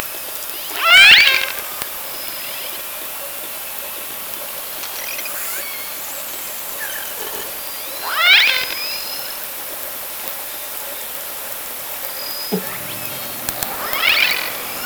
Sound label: Orca sound Night Passby